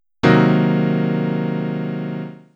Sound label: C half dim7 chord, piano